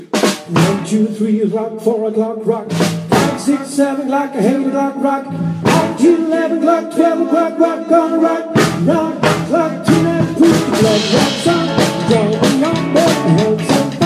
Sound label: rockabilly medley